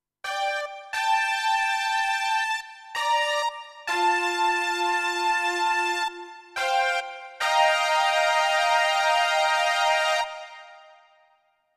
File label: High Brass